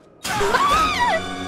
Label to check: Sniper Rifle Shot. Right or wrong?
Wrong